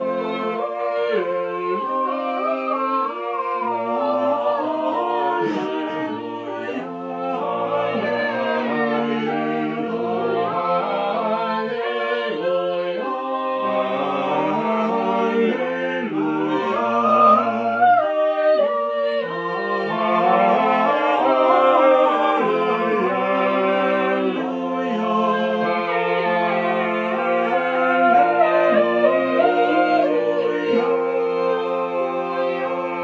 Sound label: Pastores loquebantur excerpt rehearsal; guerrero's